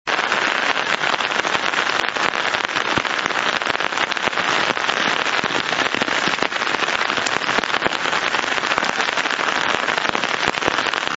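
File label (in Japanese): amagasa, umbrella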